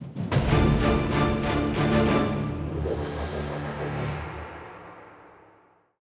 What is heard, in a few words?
190bpm; Legends of Pegasus; windows gamerip 2012